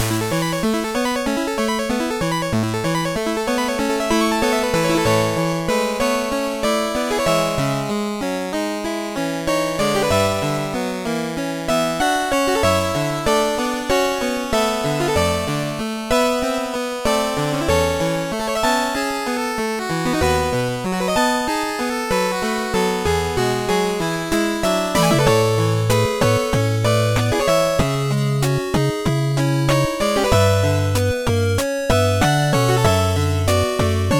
8Bit City Day loop; DPPt

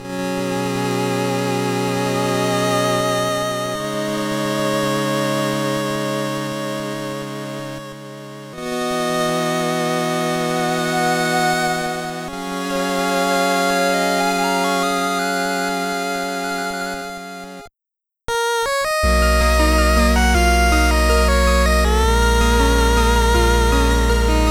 8Bit loop Start; PMD2

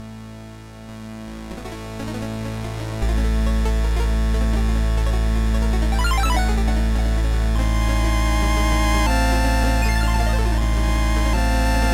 8Bit opening; DPPt

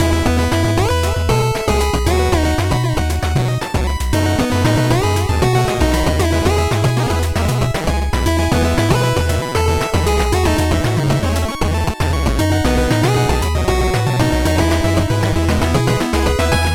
8Bit; DPPt

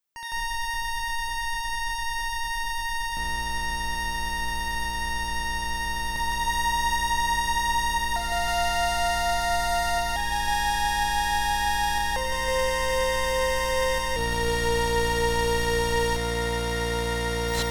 8Bit; StarTrek TNG

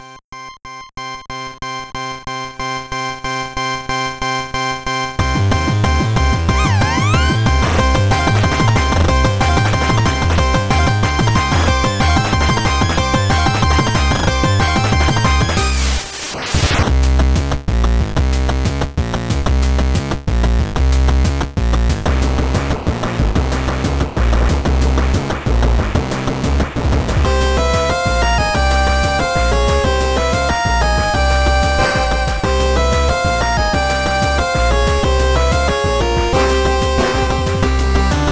8Bit loop Start Cry; DPPt

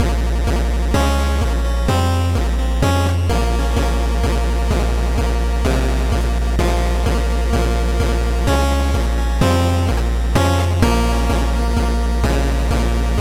8Bit Theme Survivor loop

